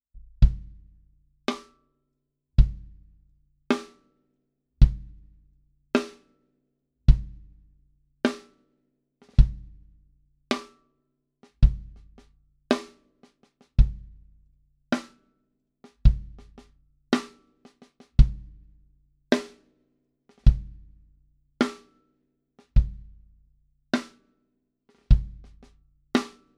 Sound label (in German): SM57 Snare Drum Mikrofon mit Bass Drum